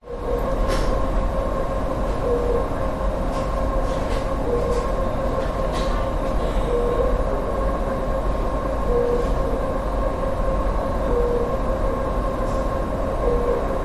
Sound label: hospital ward ambience quiet air conditioning hum whirr few voices beeps from monitor